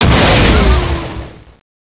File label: Explosion Fighter; XWing